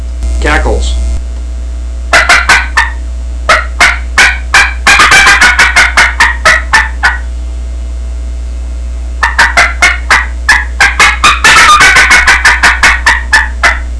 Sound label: longspur inverted cackles, turkey; Southland Sounds